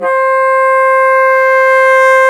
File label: Bassoons